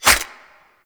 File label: Infested Packet Claws, melee attack; Warframe; Infested Lich